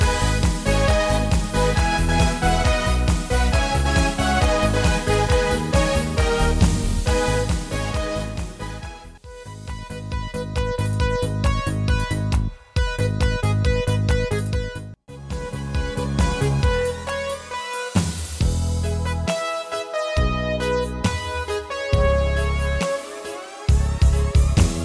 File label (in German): Mallorcastyle